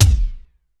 TEK K, TECHNO, Drum Base; 90 sSampleCDs; Crypton - DrumBase5000

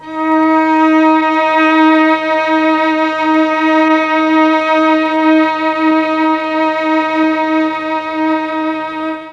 D#4 300 VIOL, Mellotron, STRINGS; Mike Pinder - Mellotron Archive CD

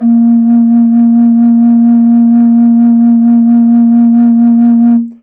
a#2, MOCENO BASSFLUTE; PS-52 AIFF WORLD INSTR 2; WOODWIND AND BRASS

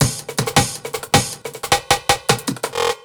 Fill, Drum N Bass Percussion Fills n Breaks

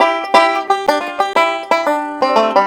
COUNTRY

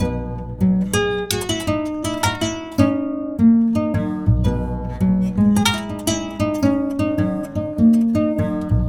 KORA; Sonic Foundry (Sony Creative Software); Continental Drift Aftershocks; African

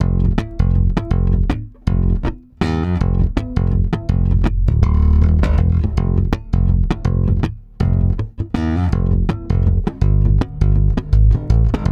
STRUM G, Bass; Spectrasonics; Bass Legends

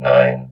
VOX VOX, Electro Vox

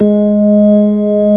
ORG B3 G#3; Best Service Gigapack 2

